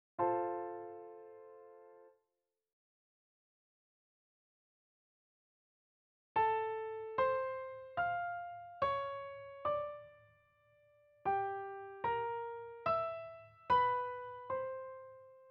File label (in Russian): melodicheskiy diktant